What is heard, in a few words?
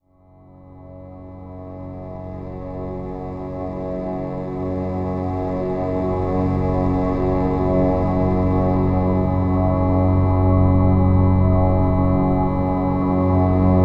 Low Complex Drone; Sonic Foundry (Sony Creative Software) - James Johnson Spektral Minimalism